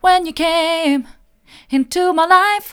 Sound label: mid, vocal